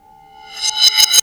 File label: Flashback